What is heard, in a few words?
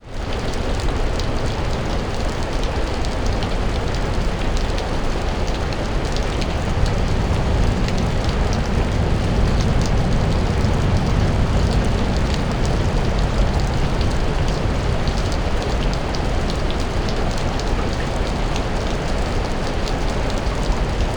Thunderstorm